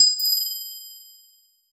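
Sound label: warm and rich magic crystal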